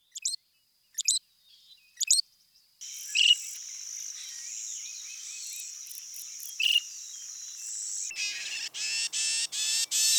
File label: Loggerhead Shrike